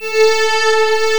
DIDI PAD, SYNTHESIZER

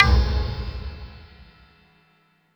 Perc, Alesis DM5

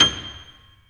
STEIN G6, STEINWAY GRD; Greytsounds VOL-1

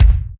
Bass Drum Set; Roland BK-5; Kicks

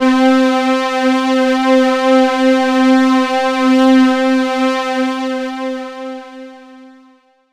36ao03syn1c, Synth Atmos, Chillout; Best Service ProSamples vol.36

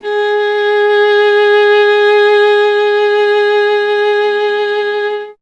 viola G; Miroslav Vitous; Solo Instruments VOL-1

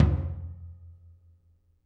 R B Floor Tom B Close; Toms; Sonic Foundry (Sony Creative Software) - Drums from the Big Room The Mixes; R&B One Shots

